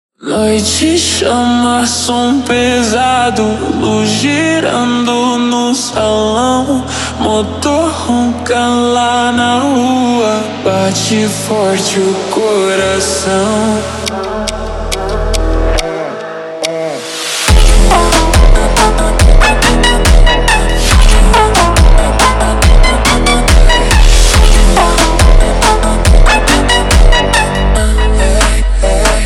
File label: slowed, funk